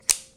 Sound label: glock installing magazine